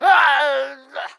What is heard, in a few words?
Pecheneg Red Death